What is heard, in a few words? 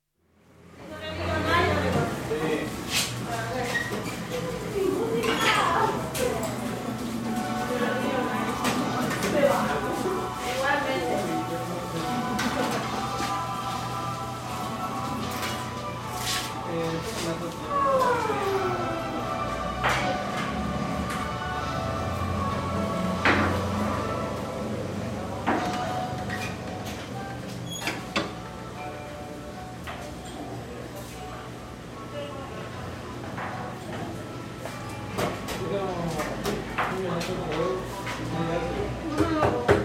Cafe, Asuncion, with distinct speech, radio, clinks etc some traffic outside; Restaurant; Paraguay; BBC